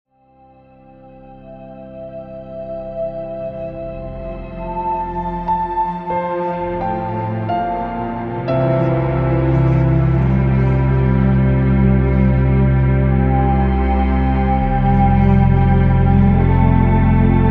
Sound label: Orchestra and noises; Advert Directors Cut